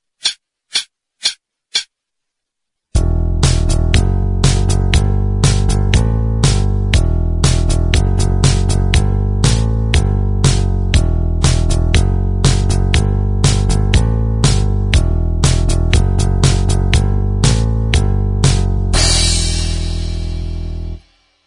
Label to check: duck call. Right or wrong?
Wrong